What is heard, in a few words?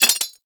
Rifle Mag Drop; Thanotech